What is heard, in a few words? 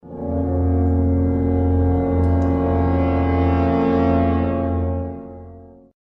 Movie Scarry Sound